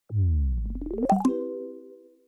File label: youtube start